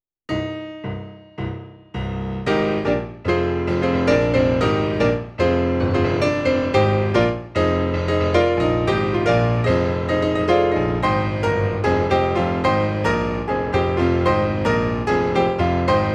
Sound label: accompaniment